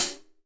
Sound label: OHH, Real Drum Kit, SWING KIT; Best Service ProSamples vol.43 - Real Drum Kits